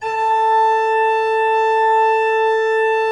A4, Cathedral Organ; Propeller Island; MIDI-ORGAN A

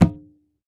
Pelegongan, Percussion; Roland BK-5

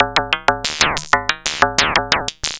FM Perc Loop, Analog Glitch

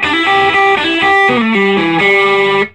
SOLO G, Vintage Blues Guitar; AKAI; USB Soundscan vol.22

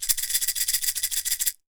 PEYOTE, PERC; AKAI S6000 CD-ROM - WorldSounds

